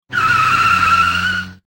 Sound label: sliding, sliding tires; sliding car sound; teststrecke game